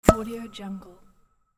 air pops

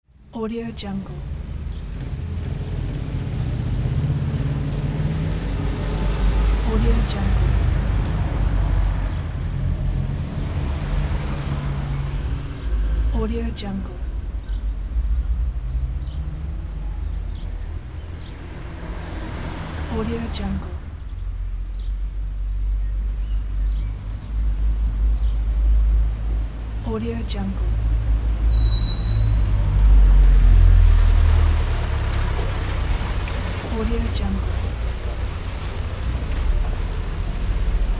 city ambience

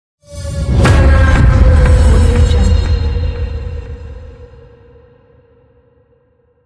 trailer teaser cinematic hit

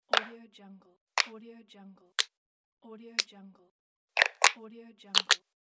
knob switch

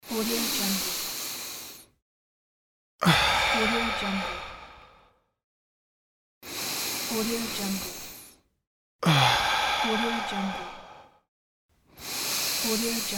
male sigh

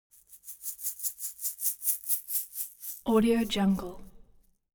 object shaker plastic egg rattle dynamic movement; smartsoundfx

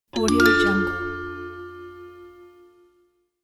antic game ding

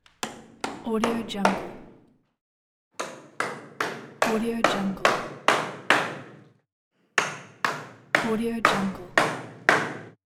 hammer; fatsound

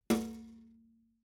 object metal bucket hit bottom soft

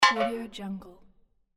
robotic clang fail

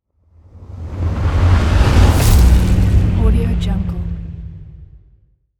cinematic scary hit

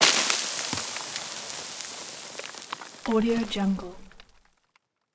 rupture sand impact; soundmorph

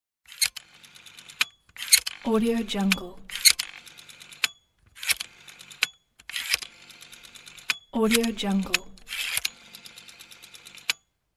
dialing a number

